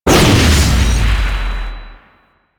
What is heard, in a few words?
Fists Heavy Punch Cast